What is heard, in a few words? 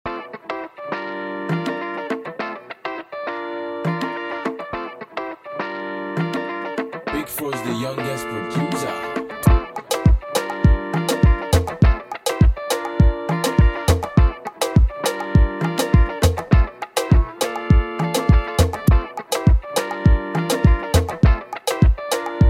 Riddim Instrumental